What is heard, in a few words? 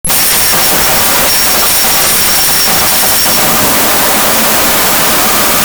Coin Drop on Wood; 1001 Sound Effects; Foley